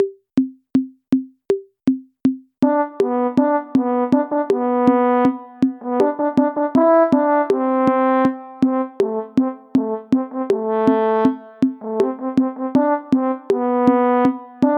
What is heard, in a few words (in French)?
Trompette Re; Eliott